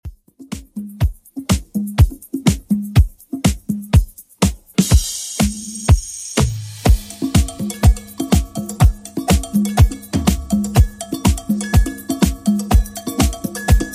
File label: 80s Redrum 123bpm